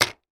household vitamin tablet container put down on table